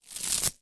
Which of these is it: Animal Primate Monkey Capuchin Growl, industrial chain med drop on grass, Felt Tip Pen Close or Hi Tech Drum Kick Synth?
industrial chain med drop on grass